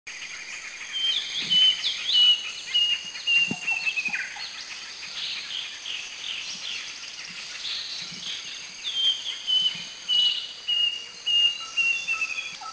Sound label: Asian Drongo Cuckoo; Thailand; Krung Ching